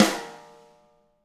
tama rim; ILIO; Double Platinum Drums 1

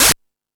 House Machine, Drum Machines

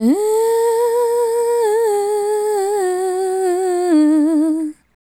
Female Solo, Hip-Hop and RnB Vocals

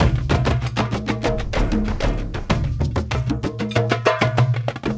Dung A Dung Diga, Drums; Sonic Foundry (Sony Creative Software); Friendly Fire; Marc Steve

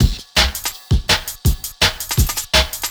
Beat, Futurist Drum & Bass; Drums & Percussion

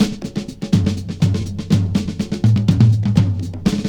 Drum Roll; Trip-Hop; Sonic Foundry (Sony Creative Software); Rondo Brothers Trip-Hop Loops & Samples

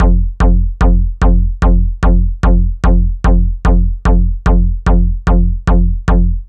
Solid Bass; Dr. Fink's Funk Factory

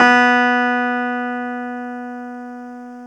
KEY X Hohner Clavinet; Clavinets; Club-50 - Foundations

